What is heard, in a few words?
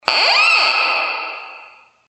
door